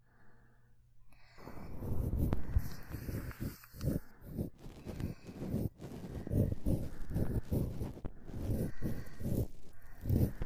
ヘラ型耳かき 綿棒, ASMR; それぞれ違う二人の愛の形; 遥(ヘラ型耳かき)・空(綿棒)

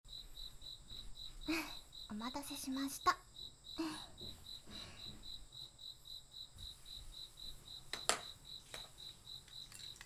左耳かき; 田舎へいこう! -三姉妹と夏の思い出; 朝日三丁目; ともの耳かき

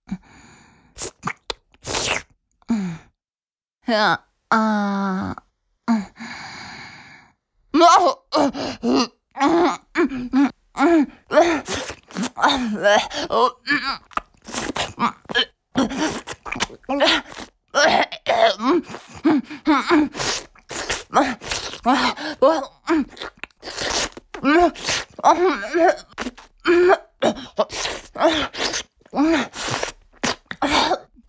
イラマチオ(強):頭を鷲掴みにされ激しく腰を振られる; 実用ボイス抜粋集

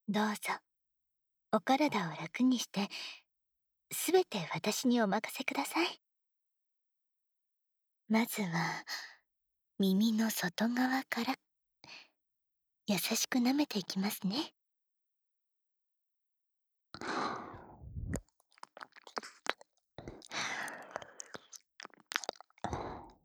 耳舐め, 環境音なし; エフェクトなし; 効果音あり

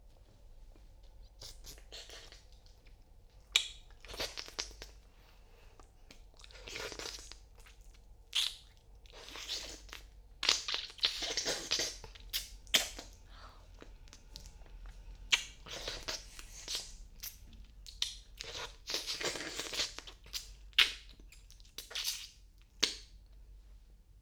別撮りチュパ音 バイノーラル

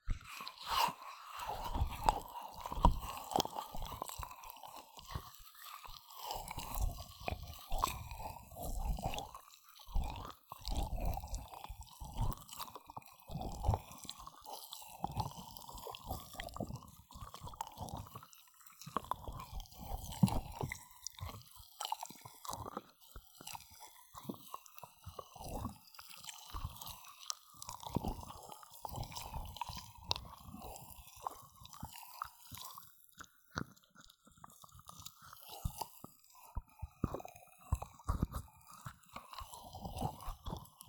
耳マッサージオンリー, ASMR

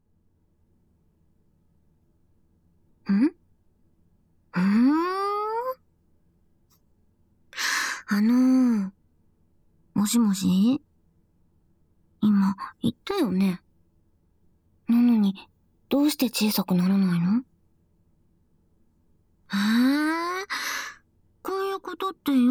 ぺろぺろ

